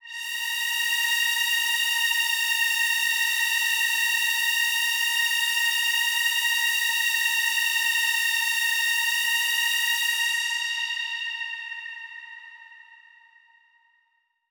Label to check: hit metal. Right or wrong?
Wrong